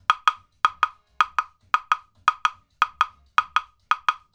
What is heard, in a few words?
110 African Ekwe; World; African Percussion